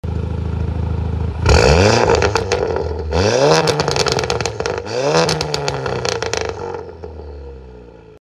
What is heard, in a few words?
Golf Exhaust